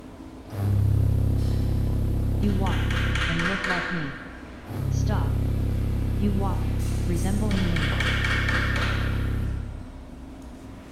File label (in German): Violonbass C und lyric